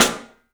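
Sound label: PICOLO SNRS, snare, drums; 3D Sound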